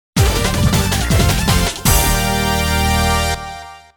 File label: Victory Jingle; Mega Man X - Command Mission